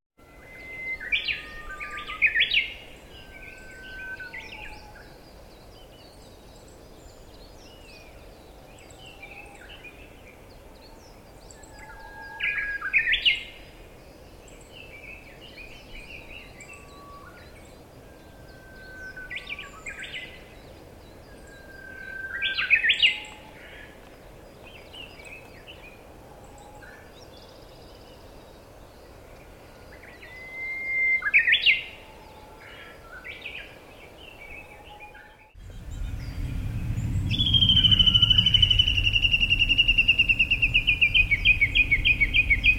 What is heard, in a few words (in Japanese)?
uguisu, bird